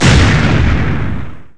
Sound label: EXPLODE; NIHILIST